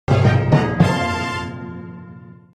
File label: win winning sounds; brawl stars